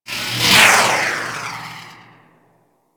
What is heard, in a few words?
Beam Gun Loop One Off 3D F, weapons; Player Path Beam; Fomorian